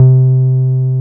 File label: 303 SUB C4M, SUB BASS